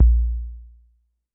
Sub2, Kick, Yamaha DTXpress-II

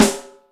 RIM ouch, Yamaha DTXpress Acoustic Snare

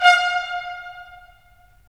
BRS, Tps Stacc; Roland - LA Composer vol. 4

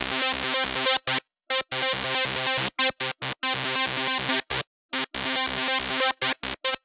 Arp 140bpm C, Trance; Arps; Zero-G Trance Inducer; 90 s Sample CDs

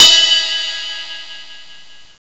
Cup Bell, Tama RockStar

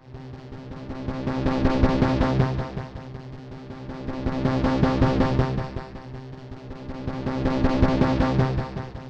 PULSE BASSES; Zero G; Malice In Wonderland

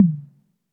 Toms, Elektron MachineDrum; SPS1 MKII

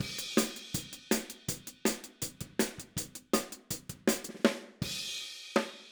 Hi-Hat, Drums, 162 BPM; Drums from the Big Room The Mixes; Take Your Toms Off; Chorus 04

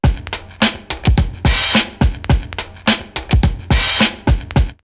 Gritty 106, drumloops OldSchool; X-Static Goldmine

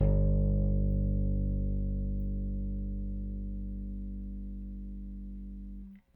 Rick Thumb A, Rickenbacker Bass; Airwindows

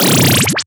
Scratching FX; Vengeance - Essential Clubsounds Vol.4; Effects

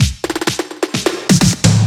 Fills 128BPM, Clubsounds

